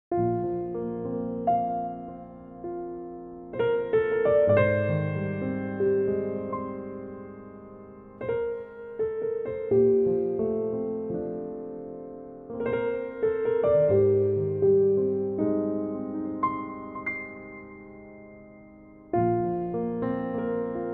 Bb piano

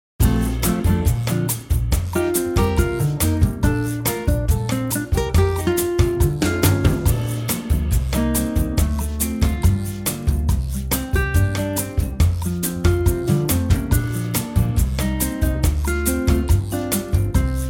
Bb Bossa

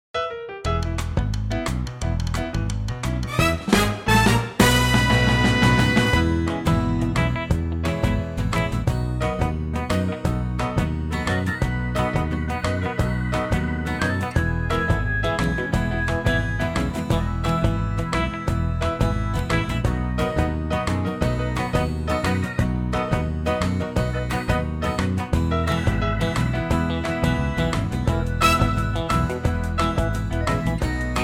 medley E

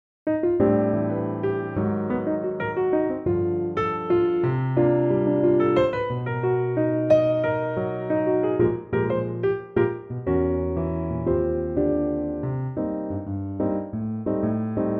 Eb piano

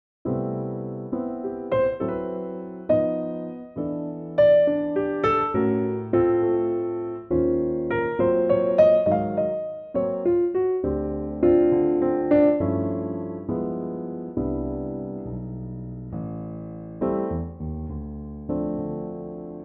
C piano

